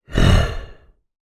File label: giant male sigh groan; fantasy